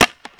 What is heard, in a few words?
shotgun, guns; tracc new shotgun tweak vol norm; trracer